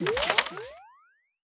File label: Navbar Missions; subway surfers city sound effects; Android, iOS